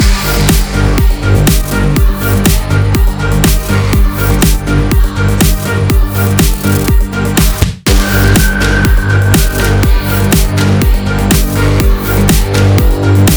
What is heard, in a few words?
Combat Loop 4-4 122bpm